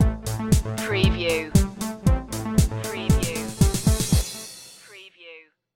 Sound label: technology beat stinger